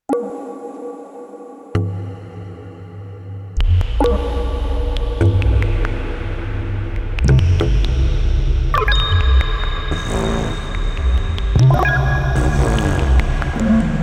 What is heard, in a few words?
Underwater